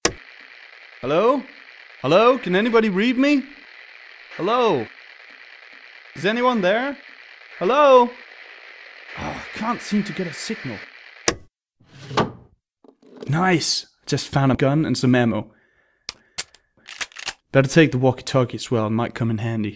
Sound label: Gun And Some Ammo; RadwinIsland